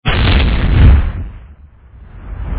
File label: Explosion